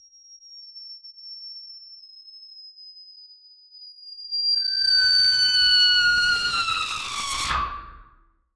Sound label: horrifying, flutter